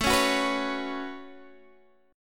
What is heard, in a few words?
A 6th Add 9th, Guitar, Standard; A6add9; strummed